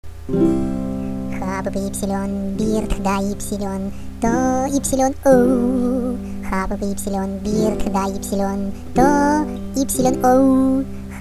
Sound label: Happy birthday to you